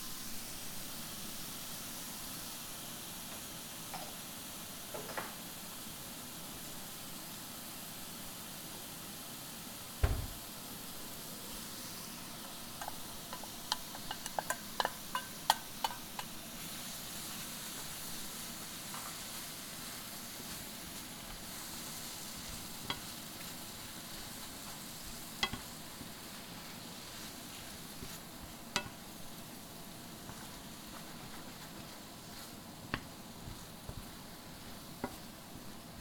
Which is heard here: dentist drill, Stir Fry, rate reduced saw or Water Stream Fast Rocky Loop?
Stir Fry